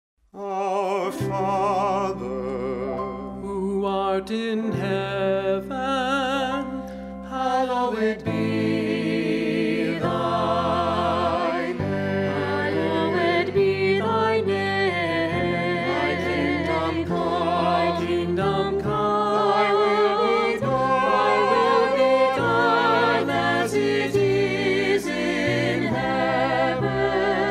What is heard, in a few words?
SATB